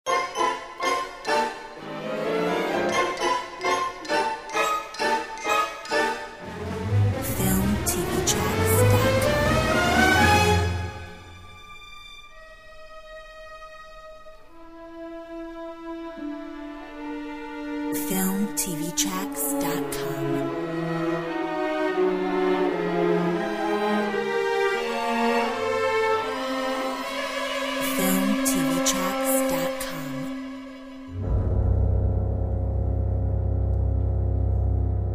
Film noir

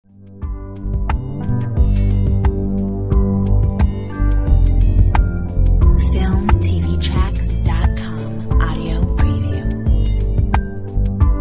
Jungle beat

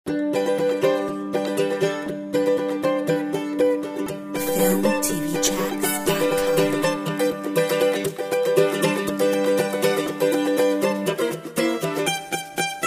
Mandolin boy

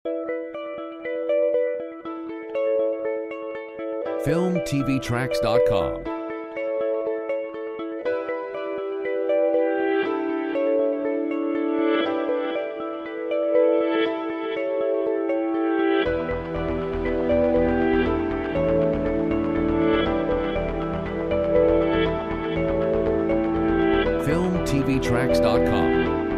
Fresh breath

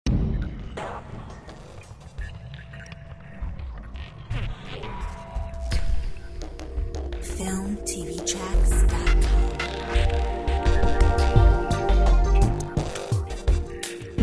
electric waves

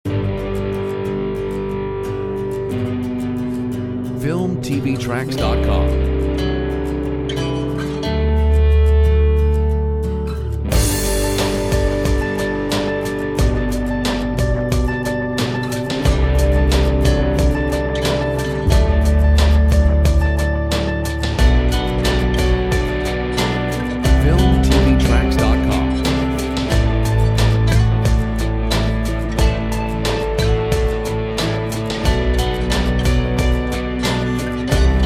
Bright and Beautiful

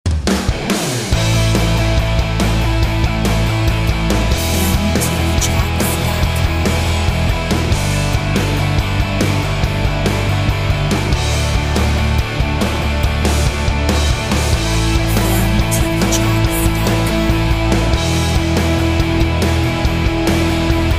Pulsing Rock

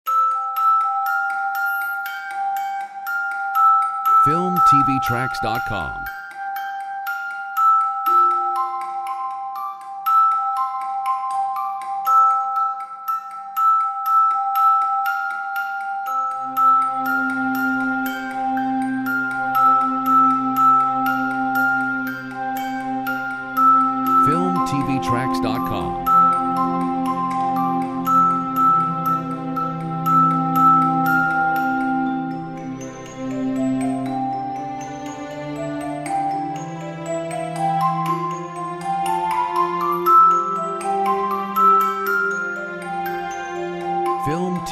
musicbox

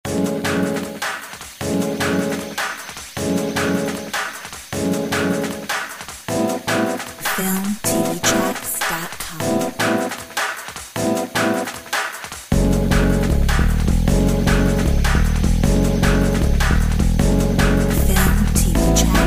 Dynamic Electronics

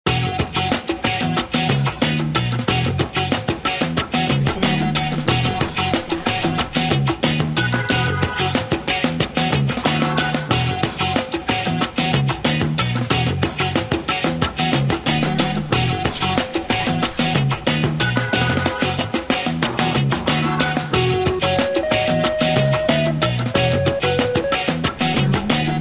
Funk drive

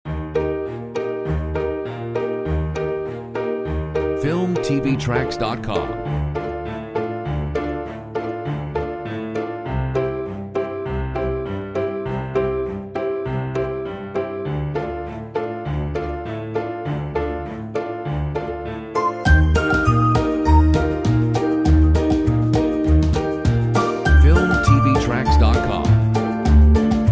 Quirky theme

